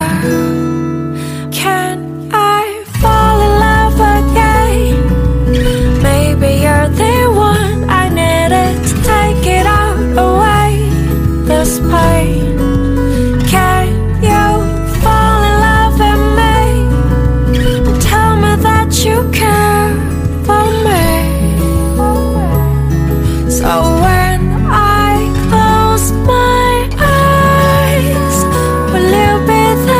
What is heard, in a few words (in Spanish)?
tono bonito